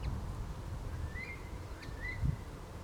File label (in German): brachvogel